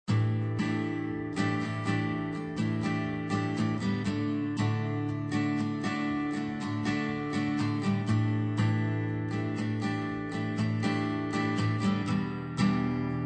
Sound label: Acoustic Guitar - Violin - Acoustic Sunburst Guitar - Oil Slick Guitar; Club Penguin Online unofficial soundtrack